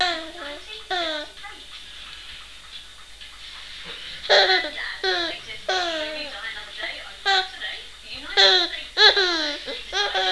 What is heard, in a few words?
giggles